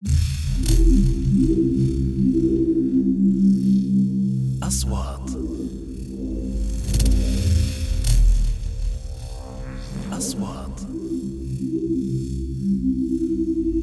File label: alian